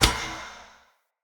Throw Catch, Swords; Weapons; Player; Mockery Weapon Common

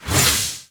Scythe Whoosh Small; Weapons; Melee; Warframe; Archwing; Exalted Archwing Scythe

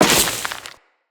Crewman Step Veg, Footsteps; Corpus

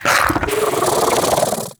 Bug Vocal Mount; Hunting Critters; Inf K Drive Bug

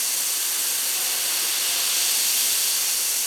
Ship Decontamination Steam Loop; Gameplay; Ambience; Warframe; Corpus Ship Remaster

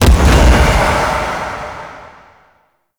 Void Dragon Orb Proj Explosion Poison; Warframe; Duviri; Abilities

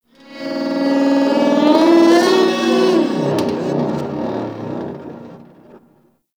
Metallic Creature Growl; Warframe; Tau

